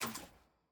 record next click; caitlyn

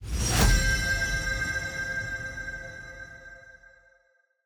draft notif your pick; champ select

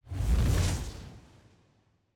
lobby trans to gameselect; parties

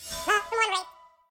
melisma; tft lny2023; intro convo

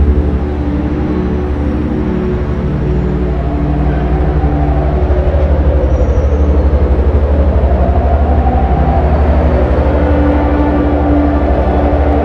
music splash; lec spring 2019

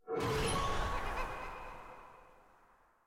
level unlock; tft

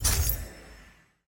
ui mythic button hover

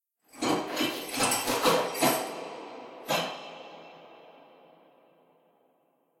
anim walk cartwheel

